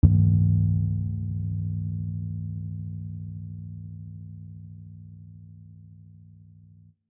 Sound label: Tolling Bell widened